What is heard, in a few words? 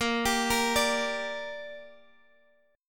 Bb 6th Bb, Guitar DADGAD